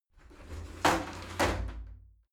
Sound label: shower slide door open regular